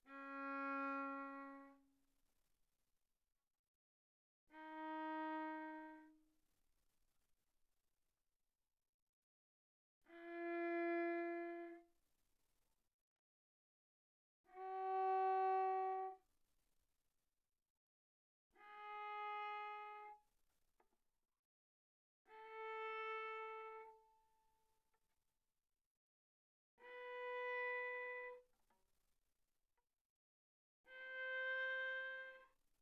Viola arco sul C pp Db4 C5

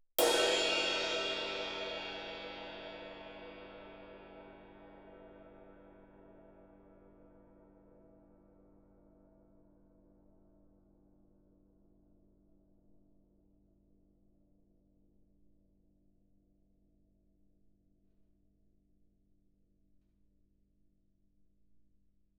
ride stick shoulder ff, suspended cymbals; Percussion

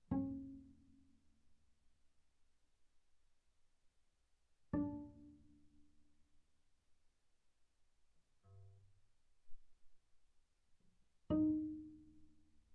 Bass pizz sul G mf Db4 Gb4, double bass

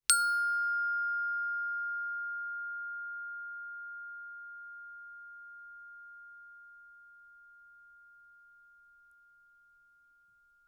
bells plastic ff F6 stereo; Percussion

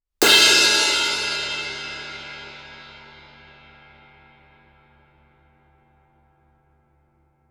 crash stick shoulder ff stereo, Suspended Cymbals; Percussion

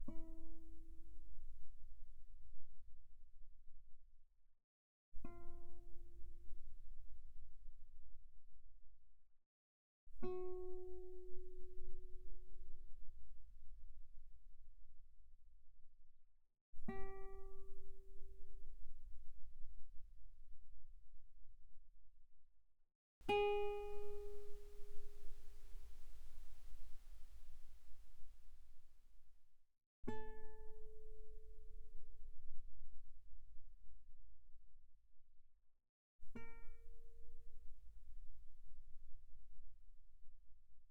Guitar pp sul E E4 B4 stereo

